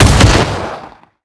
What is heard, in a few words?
Drone Missile Attacking; blue archive